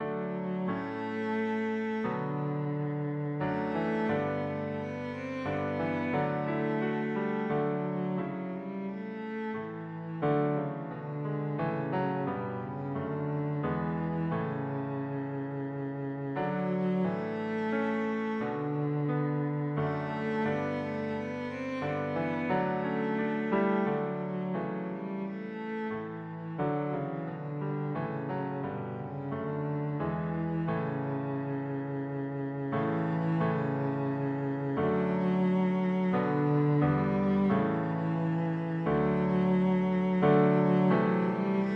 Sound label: fairest isle, cello